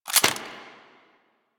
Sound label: Assault Rifle Reload; Weapons; Warframe; Grineer